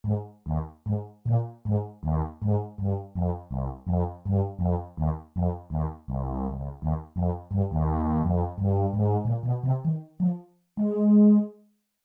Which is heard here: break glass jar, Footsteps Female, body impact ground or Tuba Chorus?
Tuba Chorus